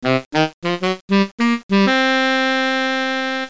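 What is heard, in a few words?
saxophone; Children's Atlas of the United States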